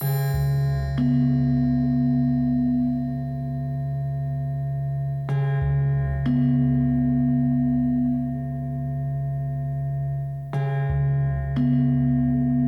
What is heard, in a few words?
Gamelan Shenai; Grom: Terror in Tibet; windows gamerip 2003